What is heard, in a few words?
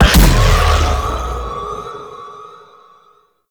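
Blast Impact; Warframe; Caliban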